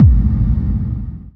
Bass Kick Reverb

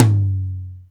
mid tom studio

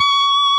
solo Left-hand vibrato

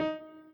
chord

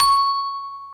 Bell C#6

